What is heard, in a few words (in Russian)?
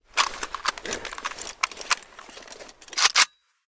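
шуршание во время перезарядки оружия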